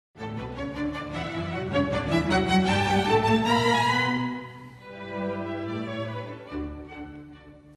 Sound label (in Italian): Cadenza